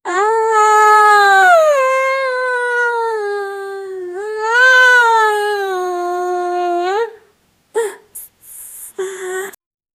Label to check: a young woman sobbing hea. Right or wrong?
Right